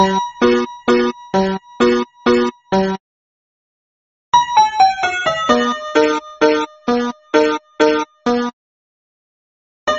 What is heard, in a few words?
dialtone; deltarune